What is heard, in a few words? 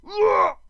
die; TOWER; hero3